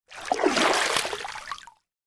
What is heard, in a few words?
water splash or movement